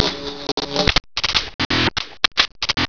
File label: STATIC, Electricity; sound effect